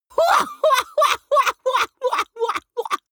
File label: laughter gloating; vocals; comedy-cartoon; soundpool